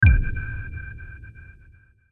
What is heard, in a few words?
Hit Low Filter Rhythm; Production Element Imaging Element Accent Transition; Bass Buttons; Blastwave